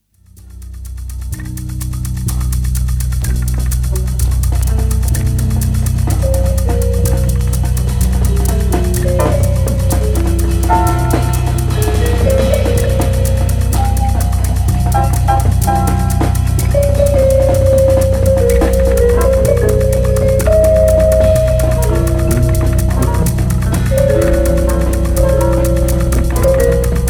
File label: 250 Bpm Freestyle